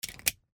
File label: Seatbelt Buckle